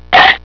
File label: croc chomp